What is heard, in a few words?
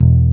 Fretless E0; Proteus 2000; Multisamples; Digital Sound Factory Proteus 2000